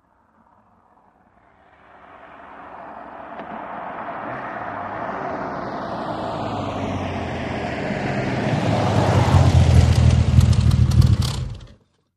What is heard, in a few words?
VEHICLES ASTON MARTIN: EXT: Approach, switch off, brisk.